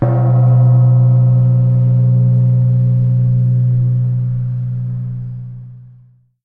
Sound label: Metal Gong Sound